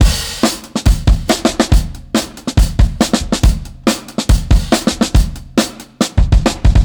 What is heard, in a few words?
Big Brown, Breakbeats, Drum n Bass; KJ Sawka; Drum n Bass & Beyond